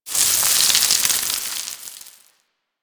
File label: Rock Crumble; Ambience; One Offs; Warframe; Quests; The New War; Drifter Camp